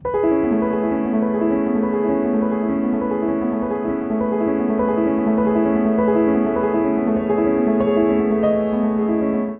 Ostinato A Lydian 100 bpm, Upright Piano; Skimming The Lake; Upright Piano by Spitfire Audio; Ableton; Factory Packs

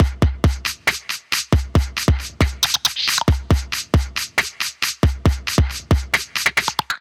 Snappy Vowelizer Kit 137 bpm; Percussion; Ableton; Factory Packs; Voice Box

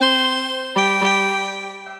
Twisted Chimes, Transformed Voices; Voice Box; Chromatic Voices

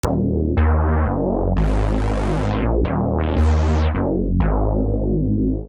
Clean Reese D# Minor 169 bpm, Bassline; MIDI Clips; Tonal; Skitter and Step; Ableton Factory Packs

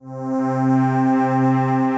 Idle Warmth Pad, synth pad; Ableton; Glitch and Wash

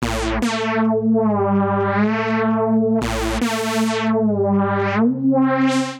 Saw Reese C Major 160 bpm, bassline; MIDI Clips; Tonal; Skitter and Step; Ableton Factory Packs

